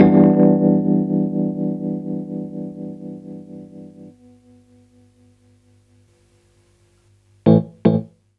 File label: keys, Roots Funk; USB Soundscan vol.41; AKAI